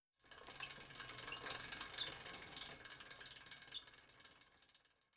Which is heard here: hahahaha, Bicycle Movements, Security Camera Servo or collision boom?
Bicycle Movements